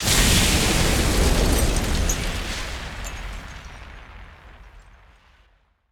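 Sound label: explosion material building; War Thunder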